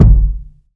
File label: rich lows bass drum G key